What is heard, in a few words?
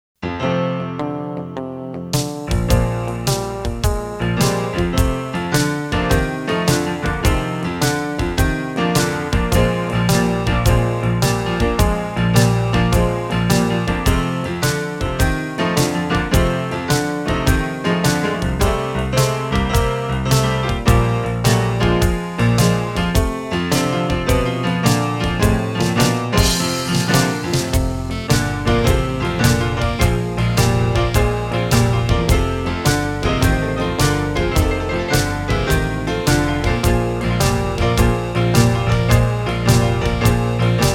INSTRUMENTAL